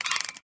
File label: Turn Crank